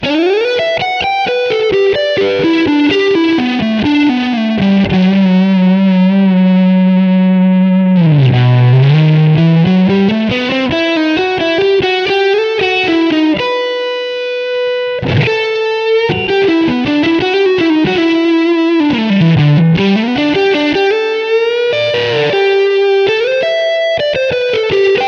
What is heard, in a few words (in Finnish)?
muovilead strat; pod2